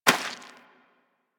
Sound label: Rodent Hop Step Rear Dirt, Forest Rodent Footsteps; Characters; Foley; Warframe